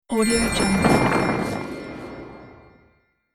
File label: magic beanstalk